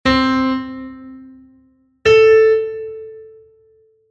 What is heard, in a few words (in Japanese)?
音当てゲーム（ラ）